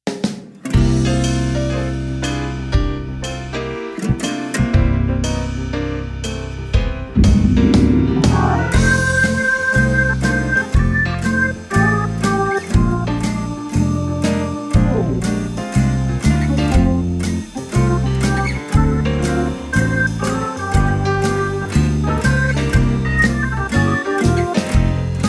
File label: organ